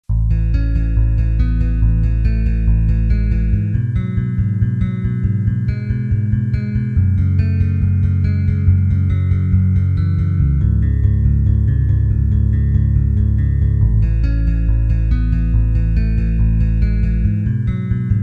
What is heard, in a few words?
instrumental